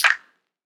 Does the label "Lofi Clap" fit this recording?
Yes